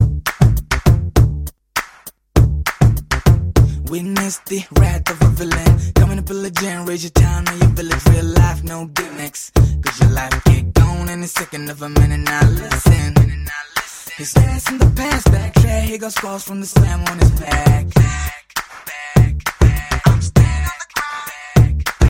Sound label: malayalam rocks ringtone